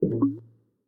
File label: wine Bottle Place Back; Keepsake